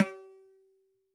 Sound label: dhin, mrid